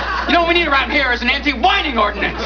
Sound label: whining